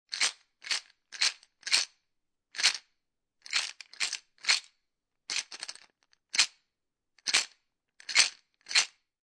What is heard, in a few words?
Jar With Beads Many Shakes, Foley, Jar, Small Plastic Jar Filled With Beads, Several Shakes; Shaking